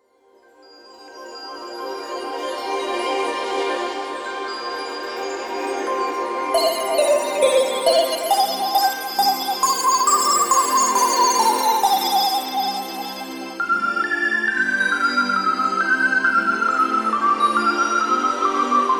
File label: Instrumental; Kinder; Der Regenbogenfisch